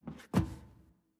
Footstep Metal Rotate

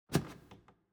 Footstep Wood Stairs

